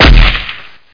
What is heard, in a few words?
CANNON; RETURN FIRE